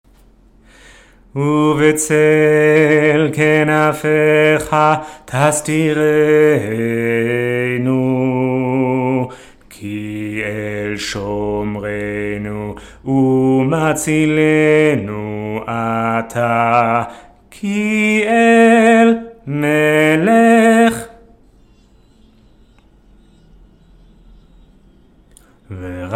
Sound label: Uv'tzel bass